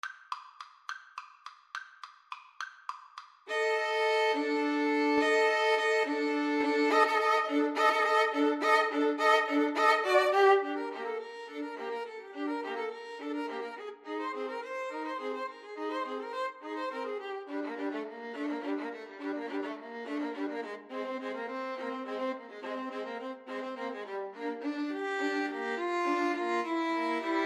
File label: danse macabre, 2 Violins Cello